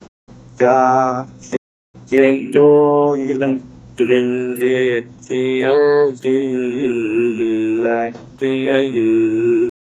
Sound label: lil pip, inside car